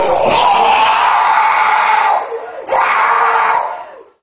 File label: scream